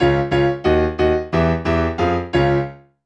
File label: Acoustic Piano